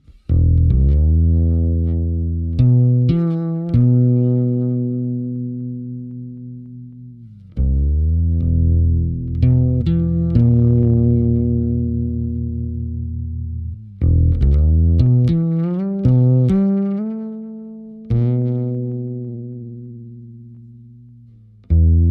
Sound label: akme solo